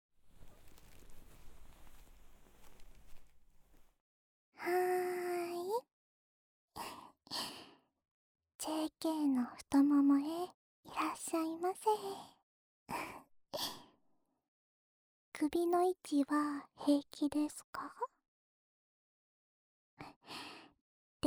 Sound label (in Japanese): 梵天で右耳の耳かき, ASMR; 最後は一緒に……のんびりと優しい時間を過ごしましょうね。